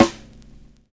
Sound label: ATMOSPHER; Univers Sons